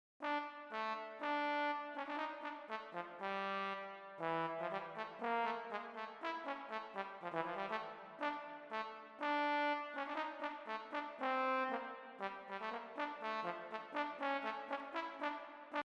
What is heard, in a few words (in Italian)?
trombon